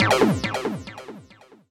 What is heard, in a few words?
TECHNO EXTRA 140